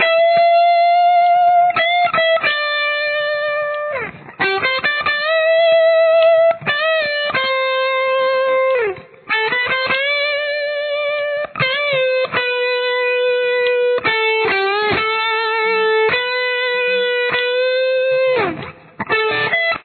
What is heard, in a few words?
solo lead, guitar